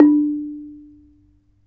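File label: ranat thum D4; Spectrasonics - Heart of Asia CD2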